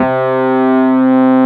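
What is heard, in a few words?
Wurlitzer C2 - L; USB Soundscan vol.09; AKAI; Keyboards Old School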